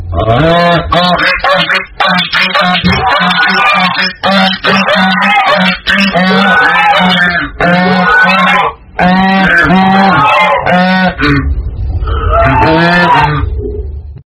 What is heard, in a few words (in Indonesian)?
nada dering suara angsa